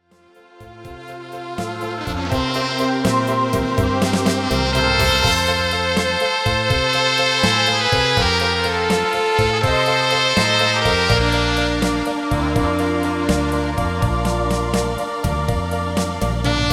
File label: Sax Trumpet